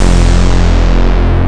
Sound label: synth